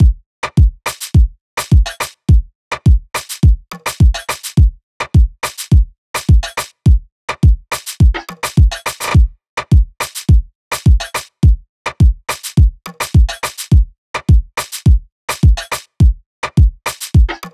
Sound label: Full Drum Loop - 105 BPM, Hip Hop; Drums - Loops; 9God; Cymatics; 9God Hip Hop Sample Pack